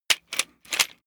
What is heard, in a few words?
plastic sundries handling